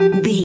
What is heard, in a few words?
ui menu button error message